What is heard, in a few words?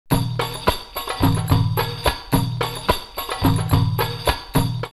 Dubai 108, drumloops World & Ethnic; X-Static Goldmine